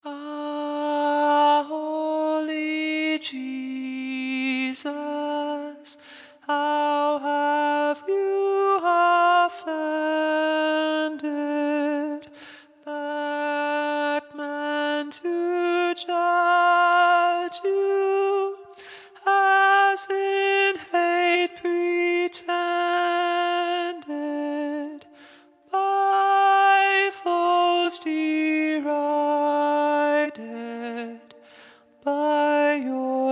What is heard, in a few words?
Alto - Ah Holy, Vocal; Ah Holy Jesus; Vocal parts for “Ah Holy Jesus”